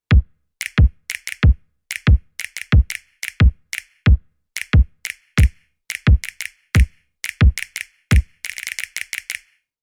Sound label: Beat; Roland TM1